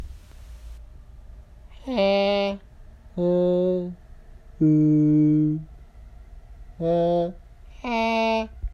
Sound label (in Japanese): 喉頭 NG; 喉頭（こうとう）